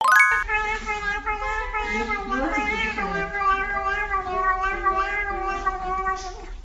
speaking cat

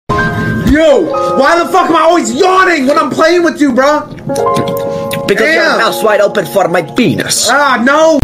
open mouth, mouth smacking

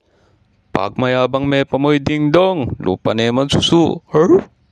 ding dong

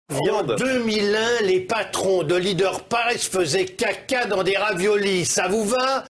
coffe

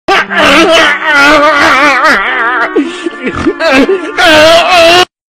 crying; calamardo

